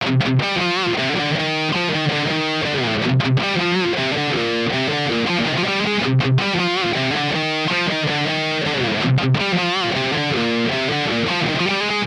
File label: Engl Powerball Marshall Mode 4